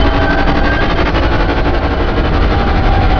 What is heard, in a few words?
pod chop loop